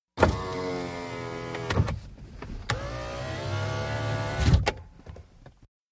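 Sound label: Mitsubishi Montero interior: Passenger side electric window open & close; 1998 mitsubishi montero int.; VEHICLES MITSUBISHI MONTERO: INT: Passenger side electric window open & close.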